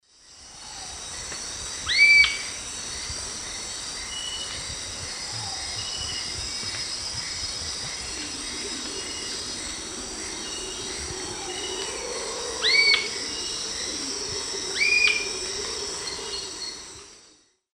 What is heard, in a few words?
Galbula tombacea, calls; S. America; Eastern Lowlands